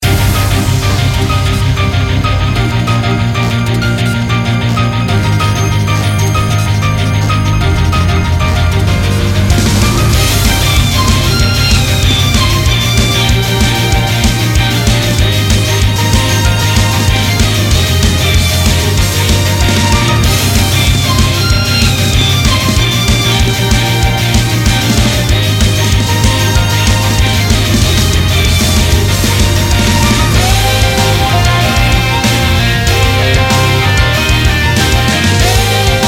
Raid; Mist Train Girls

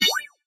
Disappear

